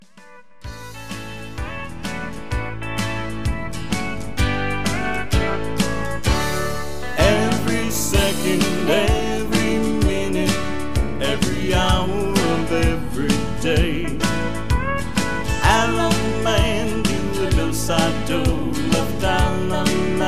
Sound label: Instrumental with BGVs